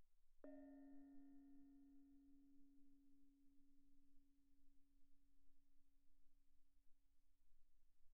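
thai gong Db4 pianissimo, Percussion gongs & tamtams; thaigongs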